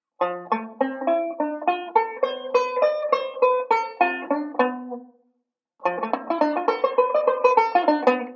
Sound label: ii V Pattern, banjo; Beyond Chord Melody Volume II